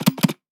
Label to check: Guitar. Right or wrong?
Wrong